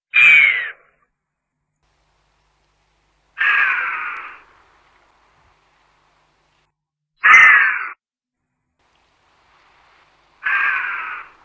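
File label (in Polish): kozica, chamois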